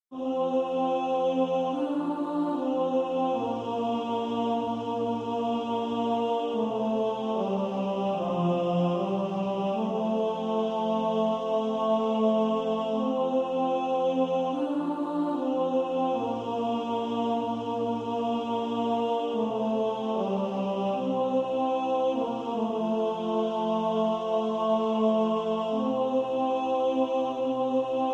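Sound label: tenor, practice music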